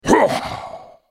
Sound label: ultimate effort; Galio; Character Voices - English